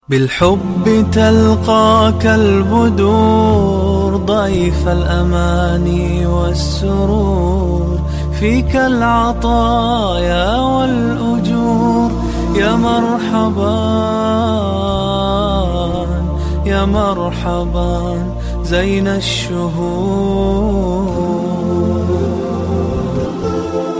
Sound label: New Arabic Islamic Ringtone